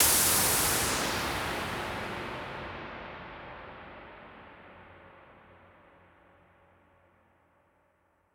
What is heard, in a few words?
Noise Verb Decays; stereo toolkit samples